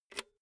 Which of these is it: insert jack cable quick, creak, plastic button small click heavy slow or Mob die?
insert jack cable quick